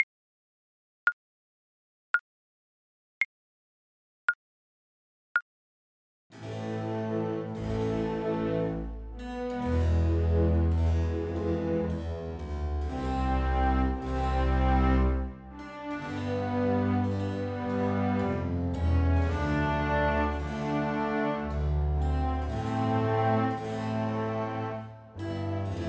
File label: sarabande viola